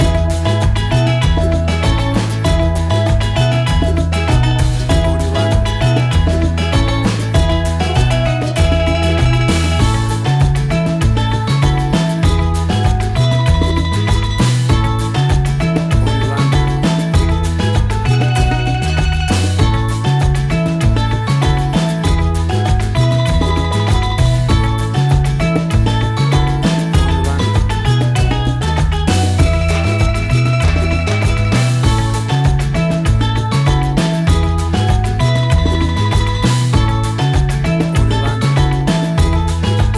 Calypso Sunshine

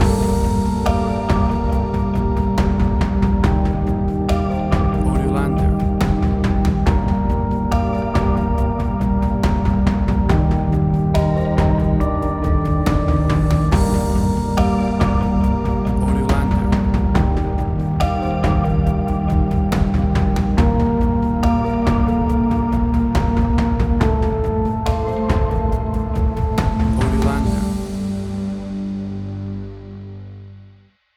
Ambient Pad Tension; 30 SEC; AUDIOLANDER

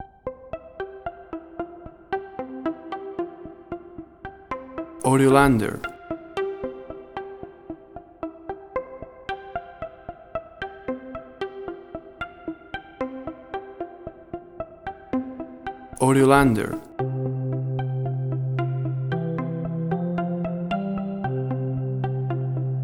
Childish Things

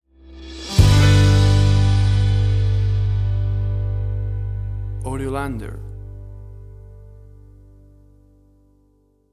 Acoustic Country Rock Band Stinger

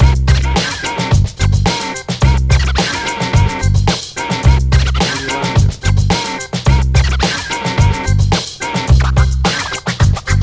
90s Hip Hop; South Central Avenue

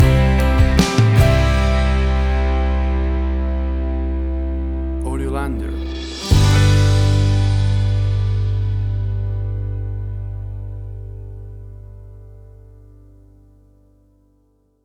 Acoustic Country Rock Band

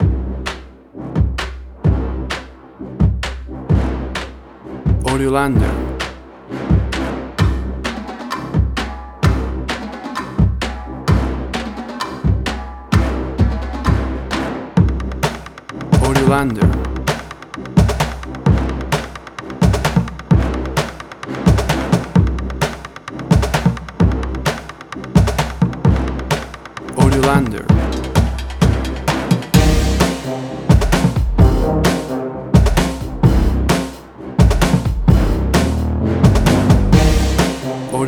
Drumcore

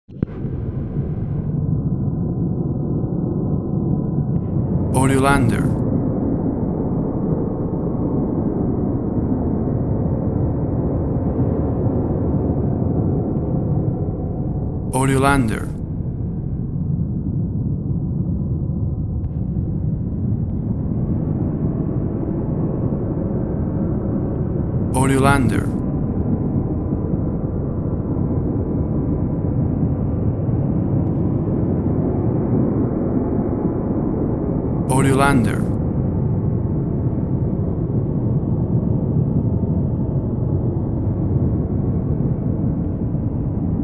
The Sound Of Space, The perfect piece of horror

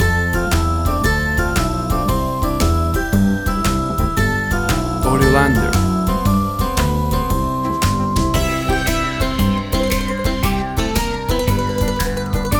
Bouncy and Fun Music for Kids

